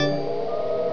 BELL WIN

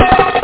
payout; Planet Fun; poker

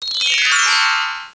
bubble in; Princess and the Pea; SoftKey

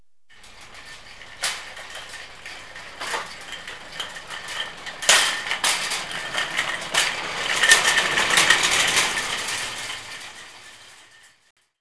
shop cart, street; KABOOM! - Wild and Wacky Sound Software; citylife

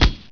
CANNON; Hidden & Dangerous; h&d demo

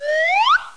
toss; Sexy Games 3

